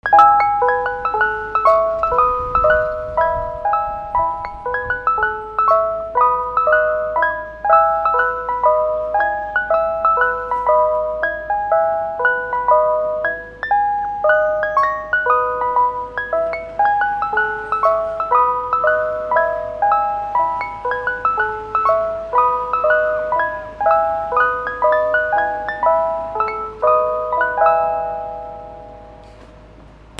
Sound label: VEHICLES